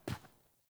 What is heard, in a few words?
Grunt Boot Walk Default, Footsteps; Characters; Enemy; Melee Grunt; Boots; Sinecure